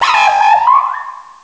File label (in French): Cri